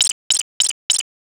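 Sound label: footsteps bush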